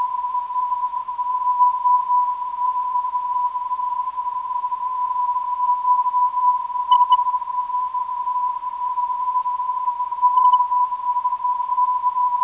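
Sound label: non-directional beacon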